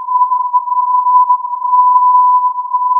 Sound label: filtered input